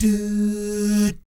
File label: DOO, Vocal Planet Jazz